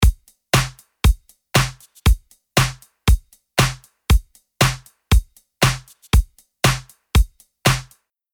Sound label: drums dry; fabfilter